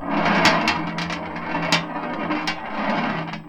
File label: Tin Tray, Marbles, contact mic; musicradar; contact mic madness; Raw